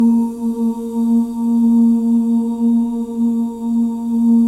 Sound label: BGV OOS; Spectrasonics; Symphony of Voices